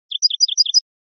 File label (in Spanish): floreo bachi bachi, campero